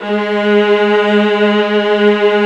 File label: G#2, Arco Violas